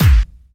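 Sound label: snare drum bass drum, Dance Mega Drums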